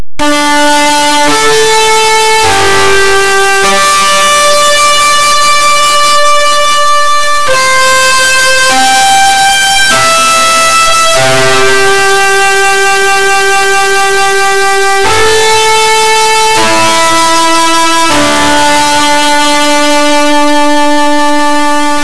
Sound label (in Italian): musica newage